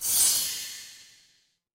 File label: magic scifi spell